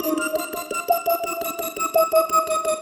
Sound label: Splinkle F, Pad Loops; Cyclick Samples; Kit One